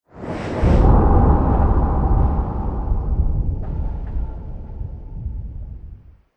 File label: Dragon Lands, monster; horror